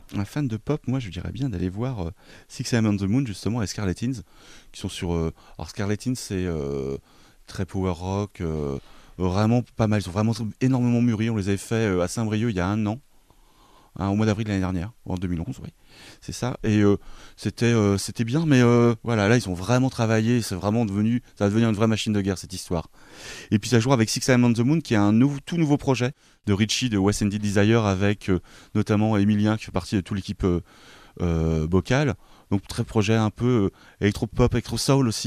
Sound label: POP